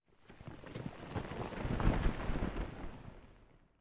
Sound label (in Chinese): 下界荒地地面声, ambient